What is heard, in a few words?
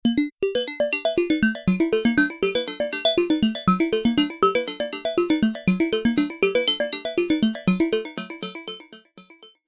jingle